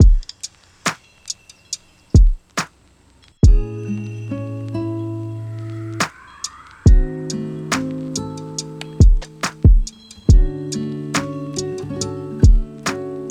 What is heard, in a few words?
lofi hip hop